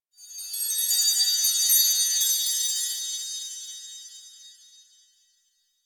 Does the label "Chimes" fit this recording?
Yes